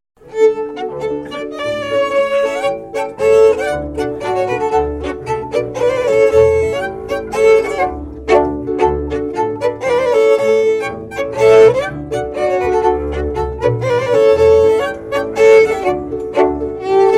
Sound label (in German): Buehler Schottisch; Giersberg